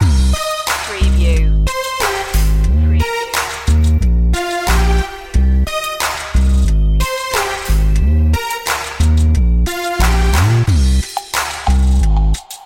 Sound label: trap it loop